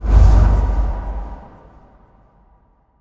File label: shadow teleport; yami